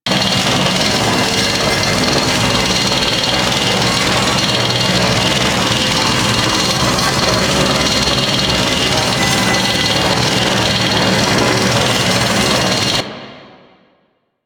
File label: Fueling Station Final Room Reactor Wheel Loop; Warframe; Corpus; Railjack